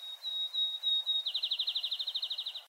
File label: field sparrow